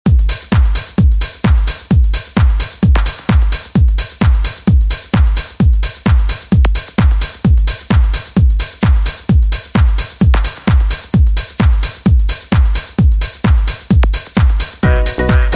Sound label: 90s 130 Bpm; Dj Intro Outro